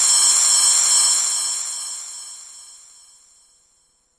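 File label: Yamaha RS7000 hi-hat cymbal F1; drum machine; Yamaha RS7000 Cymbals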